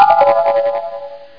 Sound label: Magic